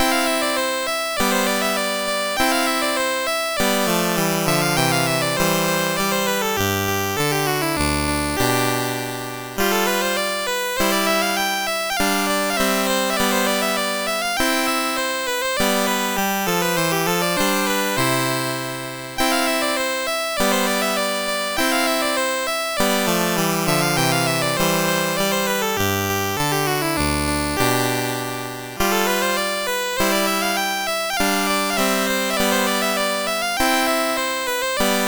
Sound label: MOS6581; Sonatina; MOS6581R2